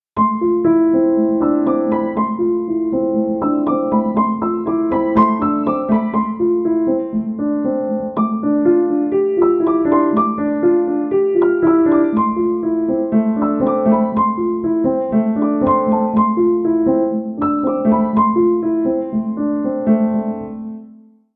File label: fazioli piano example